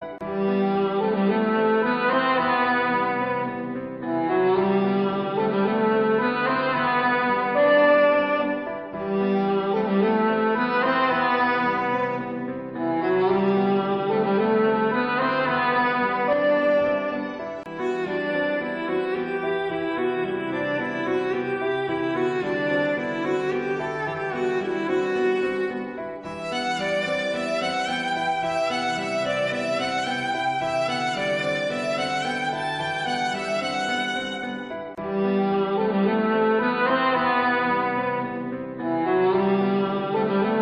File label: Sad Bgm Ringtone; Surya Web Series